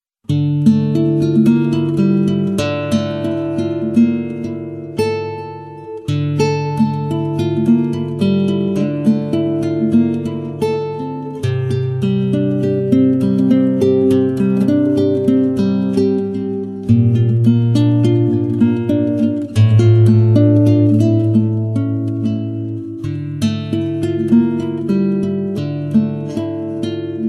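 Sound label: instrumental melody ringtone; instrumentalnaya melodiya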